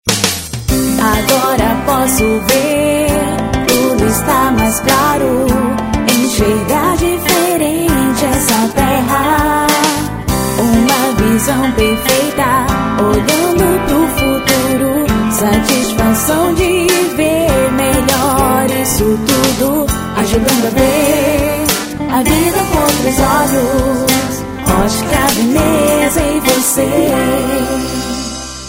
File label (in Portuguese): jingle otica